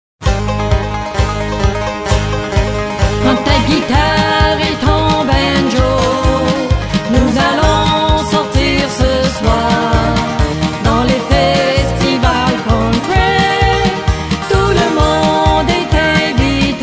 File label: guit banjo